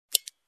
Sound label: Clock tick